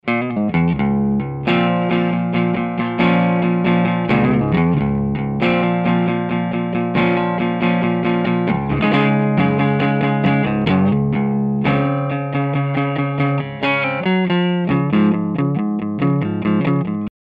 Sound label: Cabinet